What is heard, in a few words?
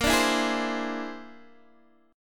A sharp 9th Flat 5th A sharp, Guitar, Open D